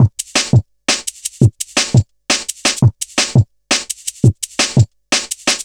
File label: Drum Bass, Drumloops, 170bpm; Zero-G; Total Drum Bass